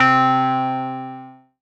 lead C3, Korg MS-20; Polyend; Simulacrum Sounds; 1 Shots; Synths; Leads and Keys

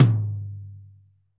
tom, Pop Country; Northstar; Drumscapes Roland

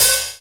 Hat Lite Open Mid; Proteus 2000; Digital Sound Factory Proteus 2000

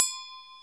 agogo, Roland JD-990

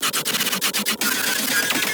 Speedy Wub Fill G, bass

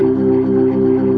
B3 C 3-3, ORGAN

